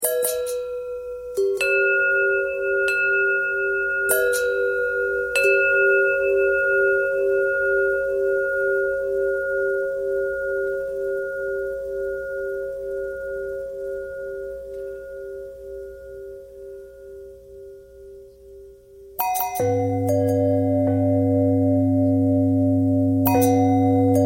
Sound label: bell long bell thread simple, bells